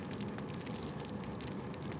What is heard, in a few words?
reel freespin; netent